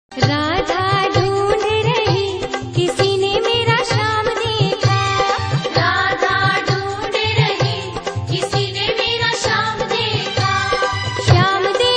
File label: best krishna bhajan